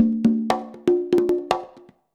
CONGA 119, Modern Percussion Loops

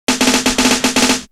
Snare Fill

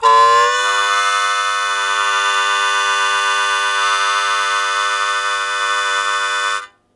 Blues Chord Attack G1, Harmonica; Zero G - Harmonica

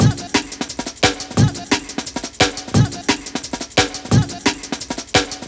A, 175, classic dnb breaks

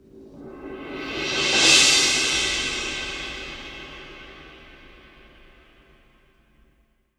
IMP CYMBAL, Cinematic Impact

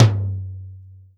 Roland R-5 Dry Tom, Drums

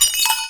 BELLS

